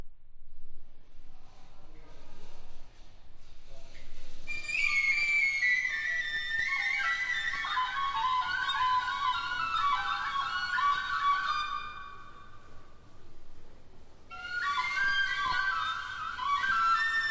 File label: All The Birds And A Telephone Ringing